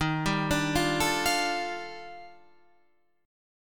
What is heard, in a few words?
D sharp Major Sharp 11th D sharp Guitar Drop D, chord; chordshape; 13,10,12,10,10,13